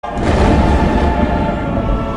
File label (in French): Tonnerre